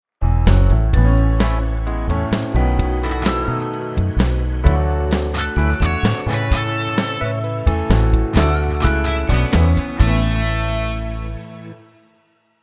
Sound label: Jingle